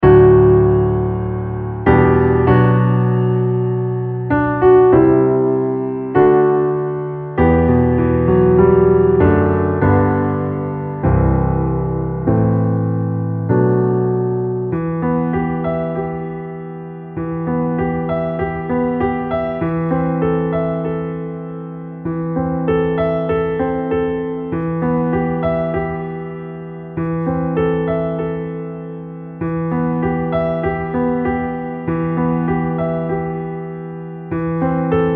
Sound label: Advent PIANO; Worship Flow